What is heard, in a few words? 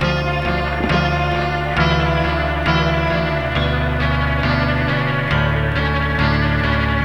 guitar, Sad